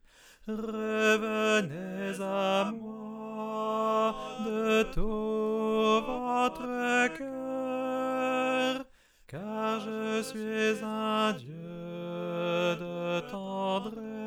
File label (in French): ensemble T; Tenor